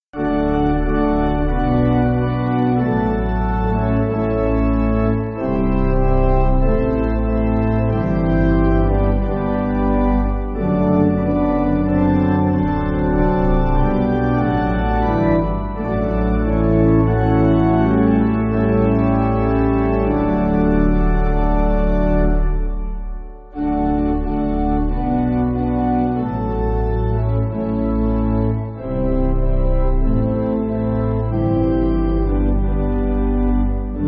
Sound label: Pipe, Pipe Organ, Backing Files for Churches